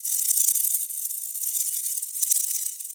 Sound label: Animal Lure Generic Call Tech; Warframe; Venus Animal Lure